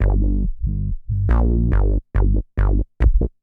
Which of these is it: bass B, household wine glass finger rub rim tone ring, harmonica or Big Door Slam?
bass B